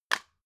drug package drop soft; arzt

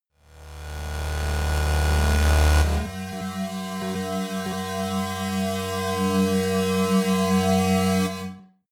bass double swell, Digital; atmosphaeren umwelt

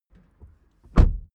suv close door soft

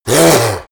yeti hiss savage; kreaturen

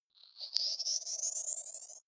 sci-fi power on

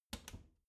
bathroom cabinet door creak close soft

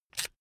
electric drill template adjust heavy out; maschinen; bohrer; soundpool

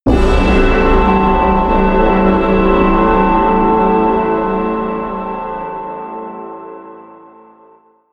eastern hit

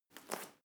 medicine ball throw; sport freizeit; fitnessstudio

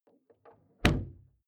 car door close exterior hummer h3; Cars

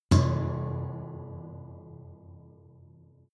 underwater metallic

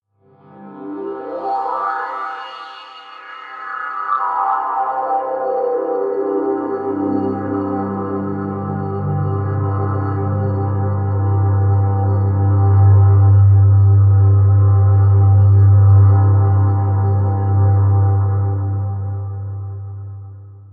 pad sweep falter; flaechen

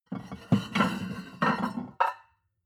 plate on plate take multiple, restaurant cafe

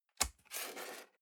cardboard box close fold scrape single; objekte

